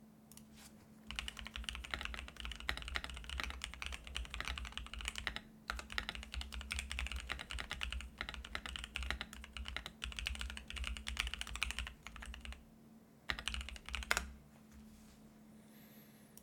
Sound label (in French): son clavier asus rog azoth